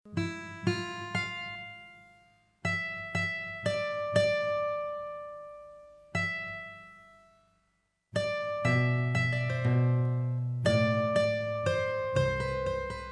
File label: Guitar